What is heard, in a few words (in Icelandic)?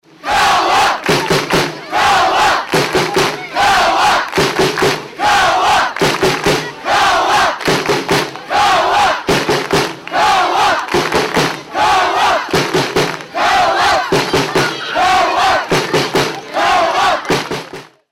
hringitonn mono